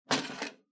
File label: Public Phone Coinbox Open